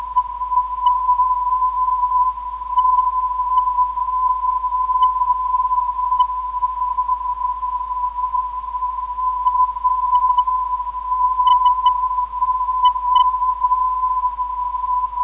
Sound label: 267kHz, ndb; FNO